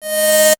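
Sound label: synth buzz; E-Lab; X Static Goldmine 1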